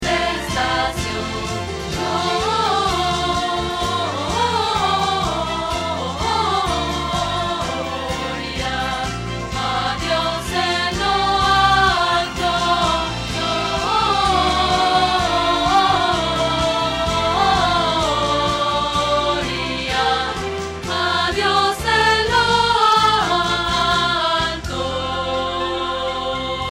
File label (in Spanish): Contralto